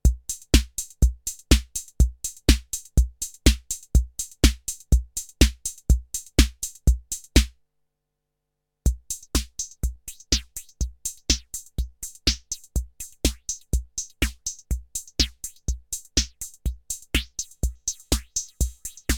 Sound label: phase shifter 606